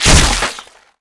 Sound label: demon claw strike; nh demon